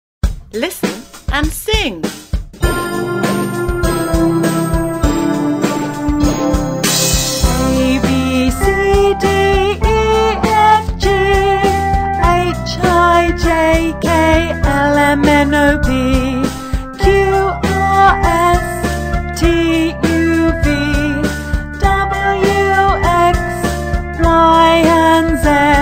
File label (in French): Chanson de l alphabet anglais; Aidez les enfants à apprendre l'anglais.